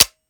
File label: weap fire first; weapons; gmod; viper; scharlie